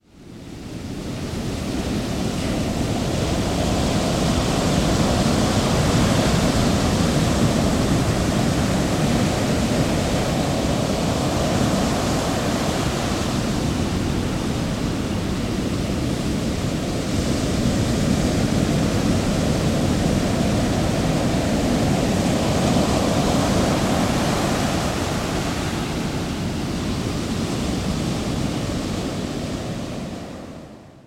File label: Ocean Waves Sound